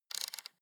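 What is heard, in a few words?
sports car interior volume button spin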